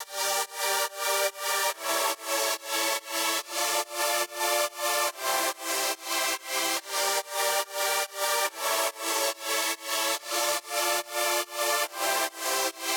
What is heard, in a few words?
PWM Kit 142BPM Chorus - Subpad WET, Gmin; Constr Kits; Melody & Synth; Vengeance; Essential Clubsounds Vol.5